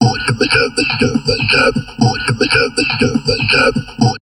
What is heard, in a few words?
TALK, Drum Loops Crazy Processed